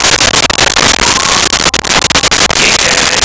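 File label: chicken; Dieren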